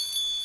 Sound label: tinkle